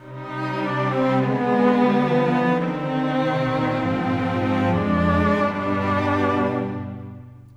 Strings; Sonic Foundry (Sony Creative Software) - Orchestral VOL-2; Modern 36

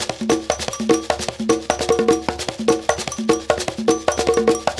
TAP, African Tap; Spectrasonics; Supreme Beats

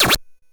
Drums, Hits; DCM8

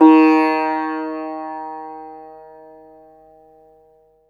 SITAR EB4; Spectrasonics; Heart of Asia

